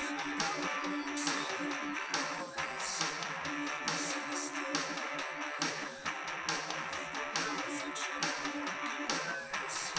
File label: wdrums liverec 250ms 5cm src